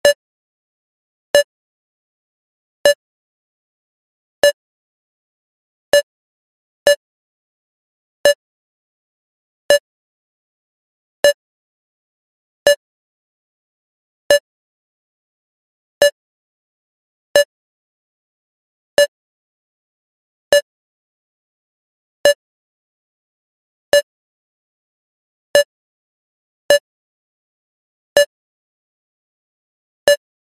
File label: public places supermarket till beeps various sequence